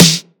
Crisp Snare Drum Sample E Key, acoustic snare tuned to the E note